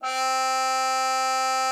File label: CUP MUTE, C4; Xsample VOL-3